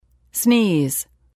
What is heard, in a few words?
sneeze